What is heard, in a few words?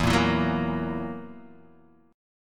E Suspended 2nd Flat 5th E down Guitar Open D